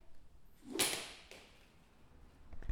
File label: Whip